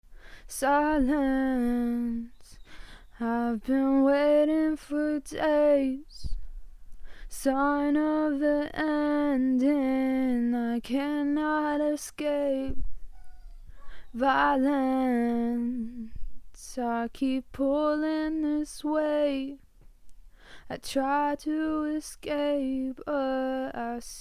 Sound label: acoustic 142 Dm, Acapellas